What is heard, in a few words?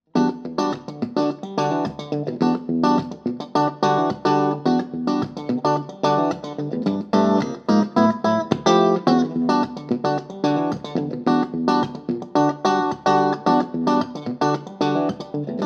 Crush Clean Reverb